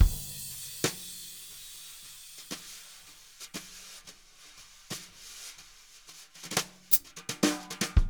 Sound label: jazz brush loops